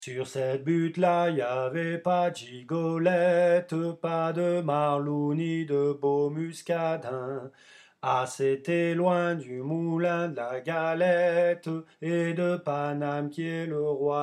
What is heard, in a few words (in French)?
Voix grave, chorale; Butte rouge